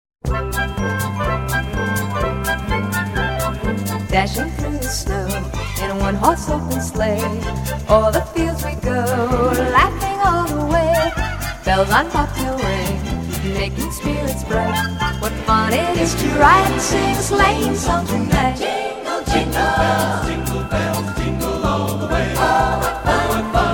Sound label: Jingle Bells